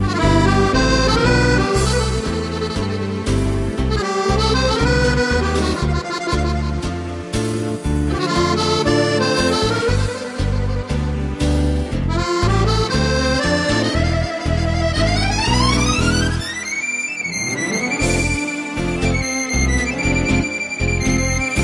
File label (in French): le tango nous invite